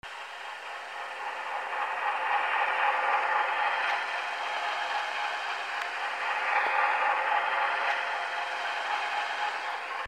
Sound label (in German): Faehre kurz; Kein Laerm Meer